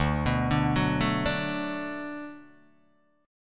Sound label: D 6th D down Guitar Open D, chord